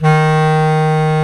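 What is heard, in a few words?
bass clarinet, Orchestral Winds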